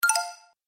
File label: Musical Game Elements, Video Game